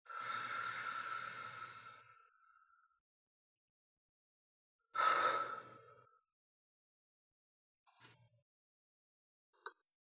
breath

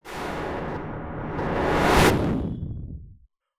explosion

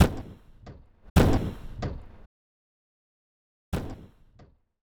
knock

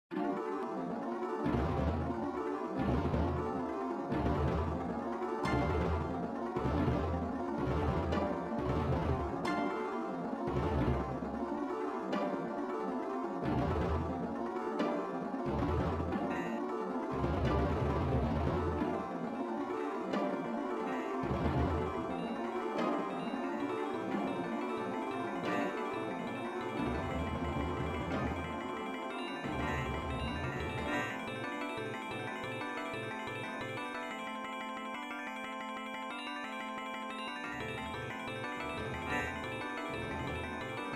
ensemble

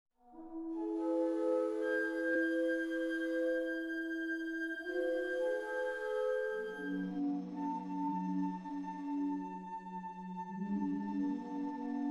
flutes

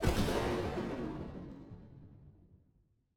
explosion

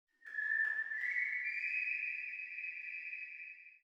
bird

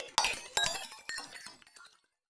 forest

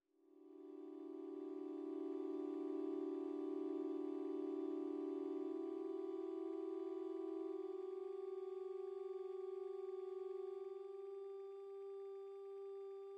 hum

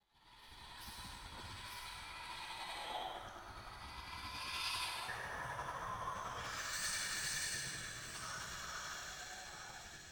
waves

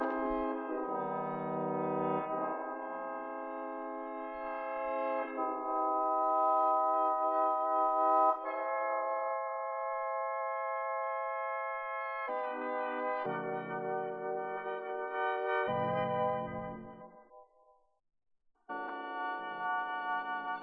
oboe

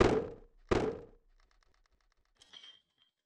bang

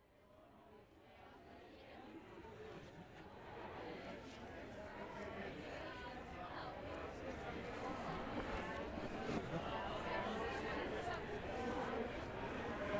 crowd

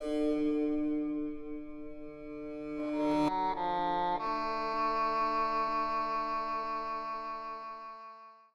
harpsichord

